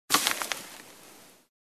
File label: Sack of clothes put down